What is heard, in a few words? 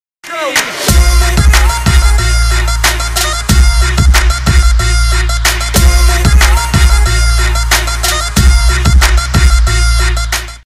Music